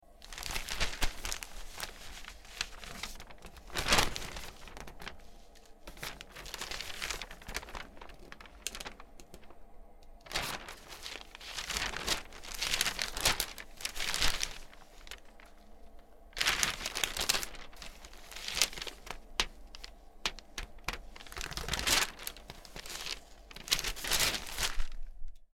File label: newspaper